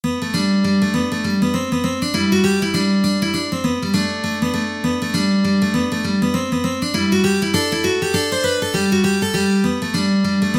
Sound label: mandolin